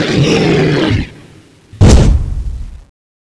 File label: attack thorny